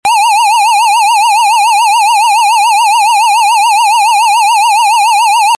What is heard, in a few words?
tone